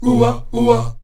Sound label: O-WA A#2, Afro Chants, Oh-Wa Oh-Wa; Roland LCDP11 Africa VOL-1